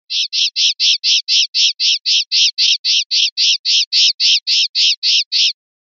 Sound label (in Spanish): rabieta